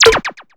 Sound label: SYS NEXT; SYS SKIP; maimai